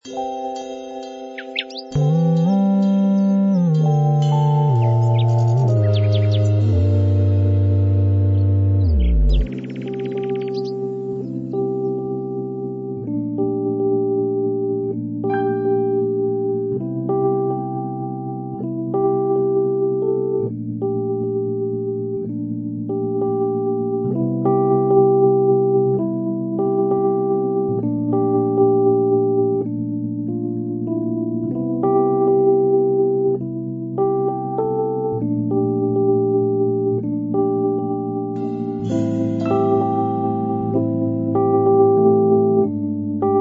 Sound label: Jingle Bells Blues lofi